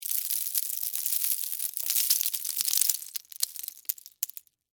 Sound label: Debris Penne Rigate; Texture; BlastWave